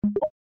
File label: popup Open